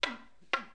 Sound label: dwarf bow; War3demo